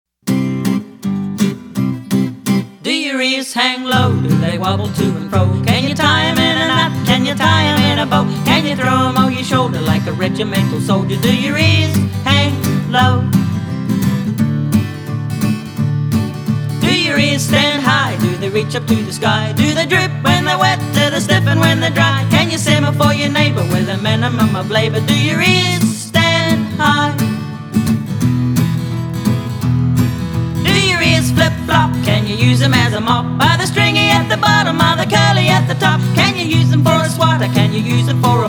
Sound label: vocals and inst